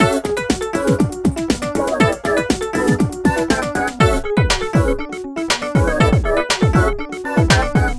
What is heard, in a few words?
alternative